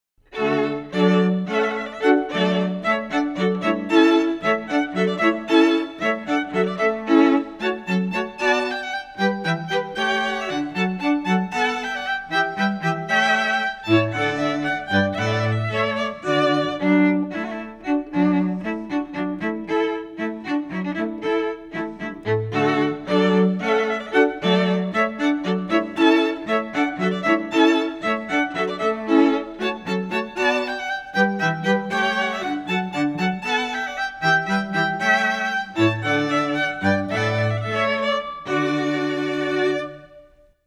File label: hornpipe watermusic quartet